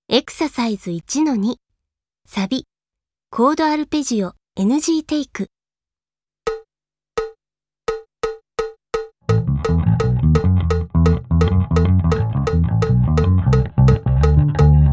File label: Arpeggio NG Take